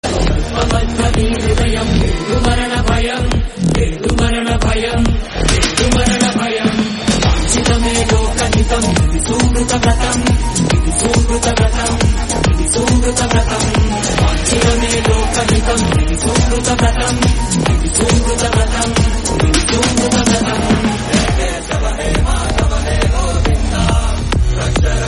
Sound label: Trance Ringtone; Krishna; Karthikeya 2